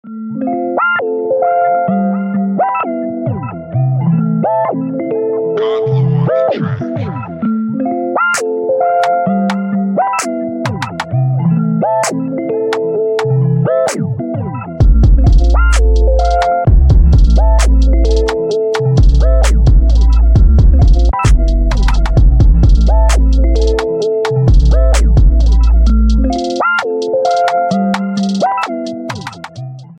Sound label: moonlight instrument ringtone; android